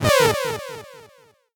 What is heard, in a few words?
Magic Spell